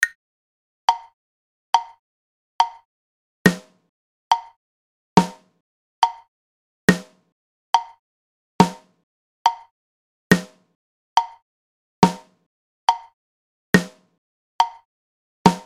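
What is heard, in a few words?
half note, drums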